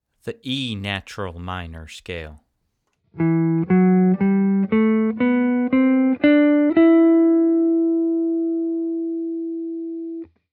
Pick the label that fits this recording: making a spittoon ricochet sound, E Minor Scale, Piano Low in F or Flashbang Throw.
E Minor Scale